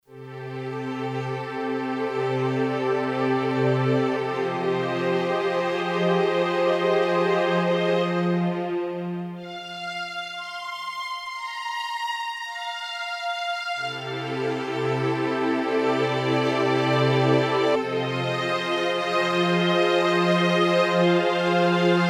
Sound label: Phaser; t.c. electronics M300